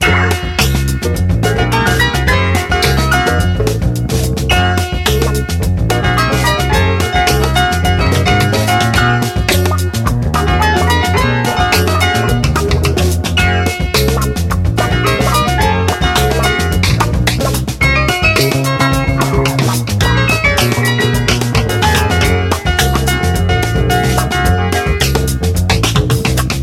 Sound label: Blues